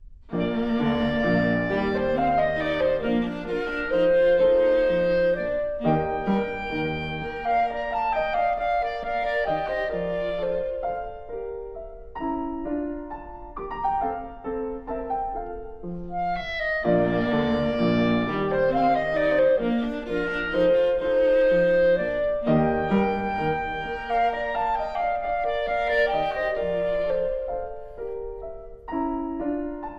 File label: Trio in E flat for Clarinet, Viola, and Piano, Menuetto; 'Kegelstadt'; Bruch Trio